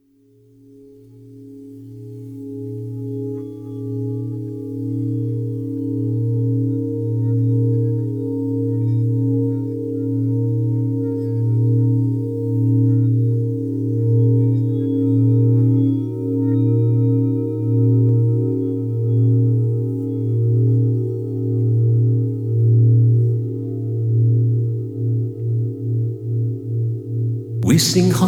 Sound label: Singing Bowls